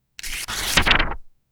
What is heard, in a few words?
clear sound of page turni, paper